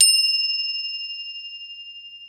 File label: CROTALE F6, Crotales